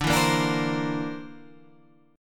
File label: D 7th Suspended 2nd Sharp 5th D